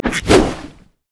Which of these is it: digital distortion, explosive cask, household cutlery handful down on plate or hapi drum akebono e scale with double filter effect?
explosive cask